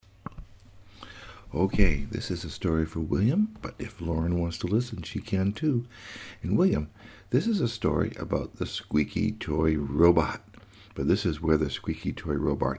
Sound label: squeaky intro; Introduction: How Squeaky Toy Robot Was Made; Ayatsuri Ningyo Robot - Squeaky Toy